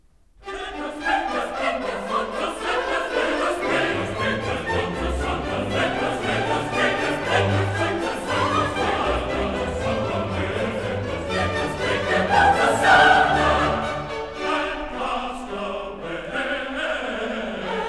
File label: Chorus; Let us break their bonds asunder; Messiah